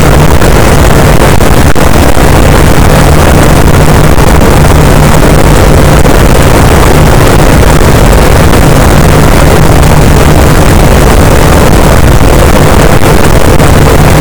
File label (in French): cachalot positif, boat; Physeter Macrocephalus